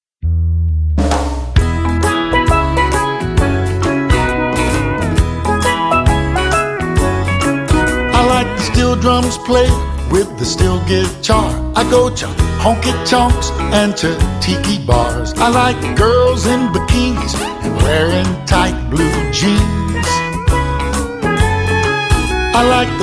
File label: Tropical Country